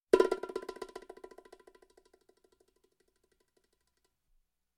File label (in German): Urwald Bongowirbel